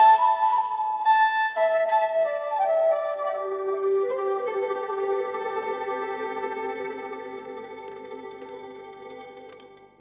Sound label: musicgen japanese traditional